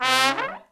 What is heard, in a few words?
SECT BRASS FX